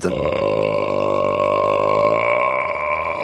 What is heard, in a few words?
burp long